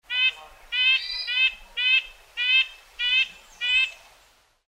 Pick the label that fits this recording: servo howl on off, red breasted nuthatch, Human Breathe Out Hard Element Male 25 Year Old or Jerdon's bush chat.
red breasted nuthatch